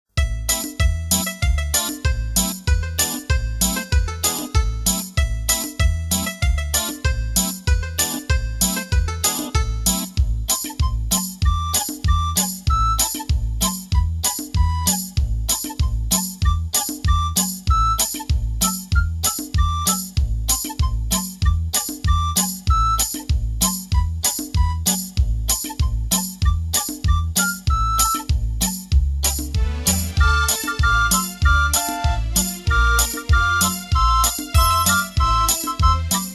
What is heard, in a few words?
instrumental